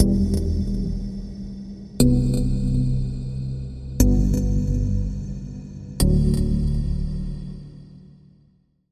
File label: Key; Routed Sound; Spire Expansion - Story Walker Vol.1